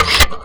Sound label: drive snatch LOUD